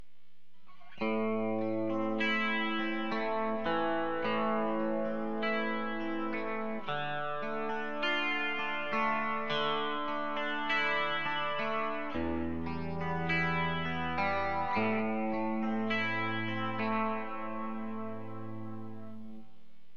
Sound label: normal polarity bridge clean, Strat; rwrp